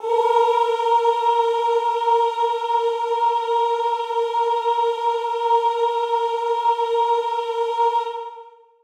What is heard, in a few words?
chorus female a#4; Sonatina Symphonic Orchestra